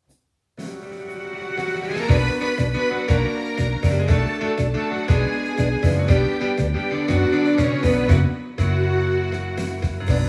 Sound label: tango